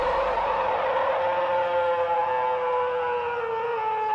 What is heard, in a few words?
scary scream; mushroom hunter video